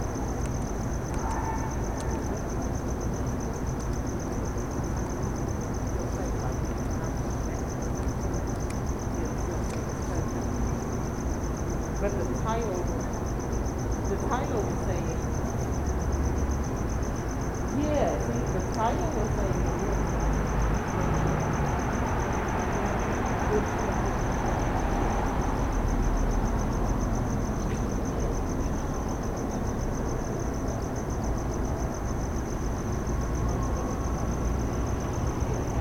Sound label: Art Museum Soundscape; Benton